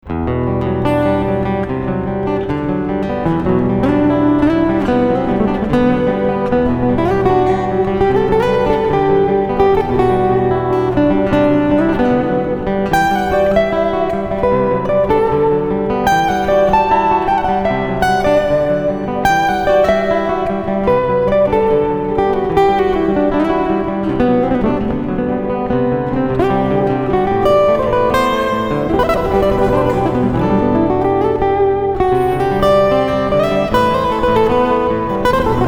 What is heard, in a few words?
Eminor 9th, acoustic guitar